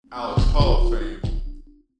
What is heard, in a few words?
Coin get